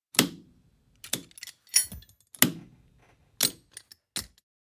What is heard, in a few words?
Helicopter foley interior perspective seat belts